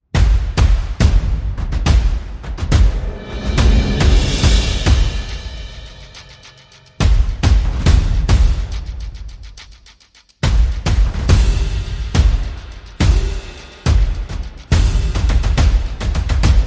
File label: Percussion and Effects